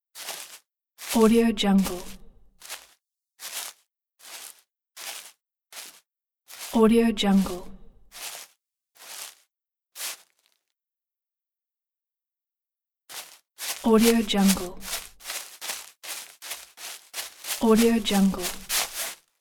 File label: footsteps on leaves sneakers